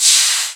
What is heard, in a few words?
steam; weapon